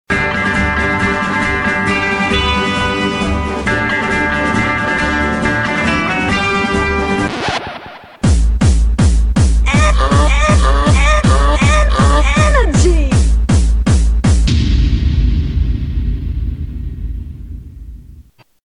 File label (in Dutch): Kermis Jingle Mix; Kermis Mixen